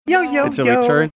singing yo yo yo

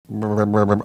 making mouth sounds

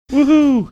oohoo

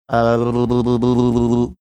making series of funny sounds short

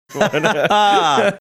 hahahaa

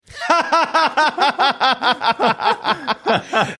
having good laugh

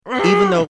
stressful groan

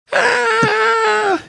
screaming

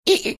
making cricket sound

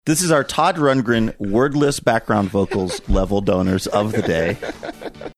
wordless background vocals

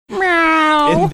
meow

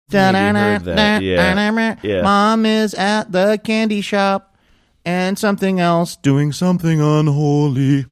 singing mom is at candy shop

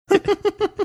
giggling

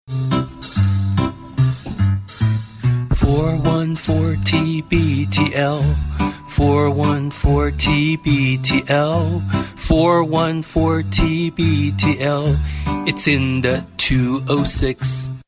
voicemail line jingle; tbtl

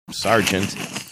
snickering

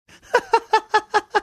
cute laugh

